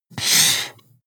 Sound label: marker mark hard